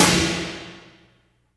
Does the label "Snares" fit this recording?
Yes